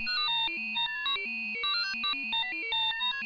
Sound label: rotate calc